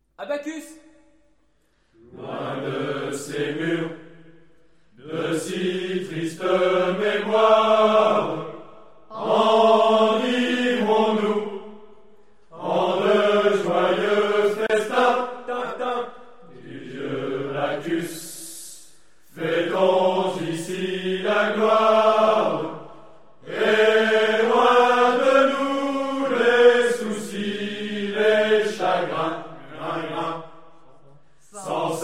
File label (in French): Hymne couplet; bacchus; Couplet École